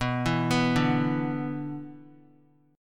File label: B-Minor Double Flat 5th, Guitar